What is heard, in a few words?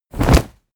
bodydrop textile